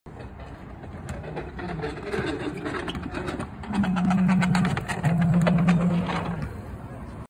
electric scooter; اسکوتر برقی

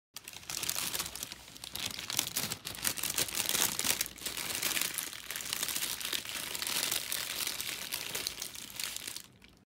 crumpled paper; مچاله شدن کاغذ

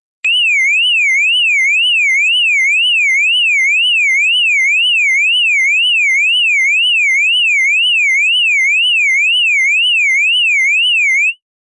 distant alarm car

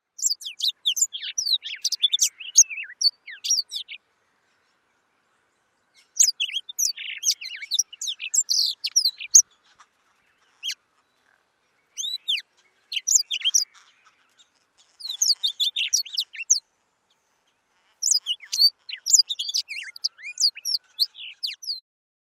Rüppell's warbler; سسک گلو سیاه

